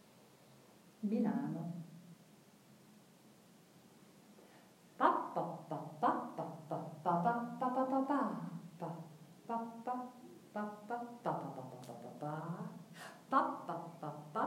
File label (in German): Chant ternär